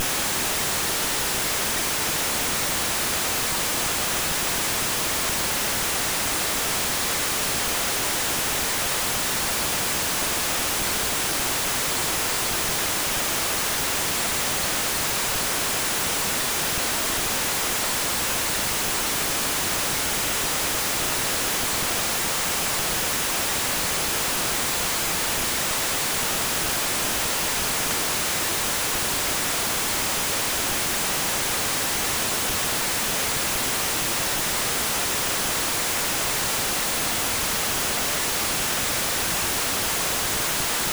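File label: noise no filter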